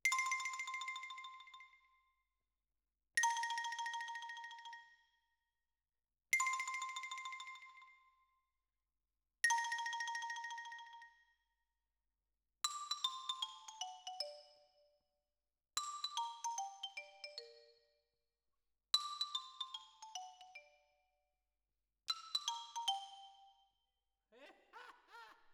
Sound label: Xylo